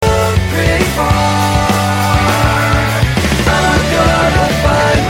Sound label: Game Tune